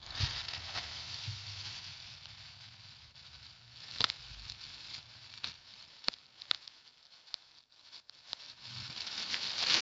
time lapse sound of plant

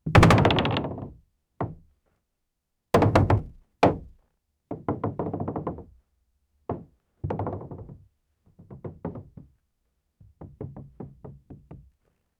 human body hard impacts, blunt impact

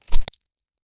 juicy squish or plop soun; fruit

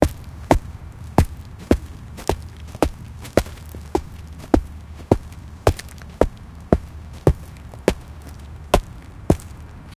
bear

